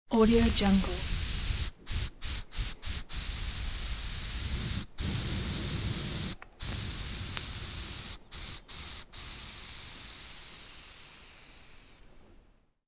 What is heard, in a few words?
weak air leak